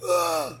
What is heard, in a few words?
scream